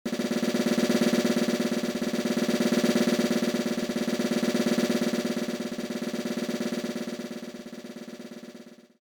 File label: Score Fanfare; nicktoons freeze frame frenzy 2004; gba